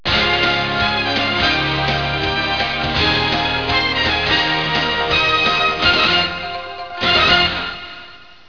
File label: Sportscaster theme song; ESPN